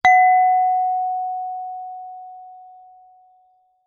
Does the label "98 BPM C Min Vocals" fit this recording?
No